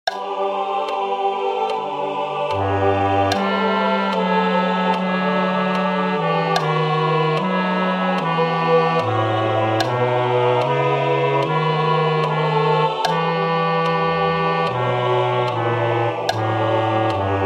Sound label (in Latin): Adeste fideles